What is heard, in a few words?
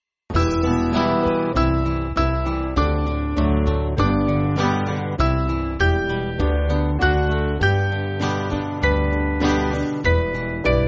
Band, church music; O Food Of Men; O Esca Viatorum